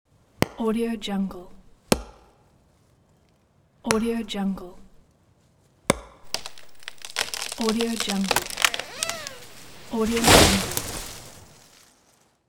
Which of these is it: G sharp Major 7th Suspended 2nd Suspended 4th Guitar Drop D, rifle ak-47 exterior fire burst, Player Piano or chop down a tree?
chop down a tree